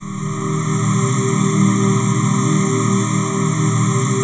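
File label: trance pad; Best Service; XX Large - Pads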